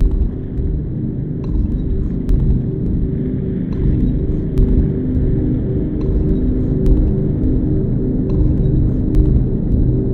chamber loop; ragemap2019; rng

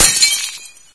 bust glass, debris; mistake coop

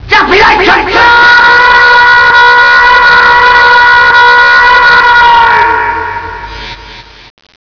x die; svencoop; cracklife

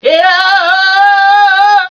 attack; svencoop; cracklife; controller

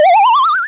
snake lose; w00tguy; arcade

